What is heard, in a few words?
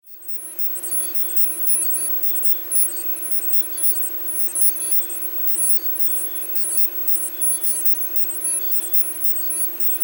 Emergency Surveillance Truck Interior; Emergency Vehicles